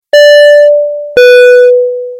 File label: flight attendant single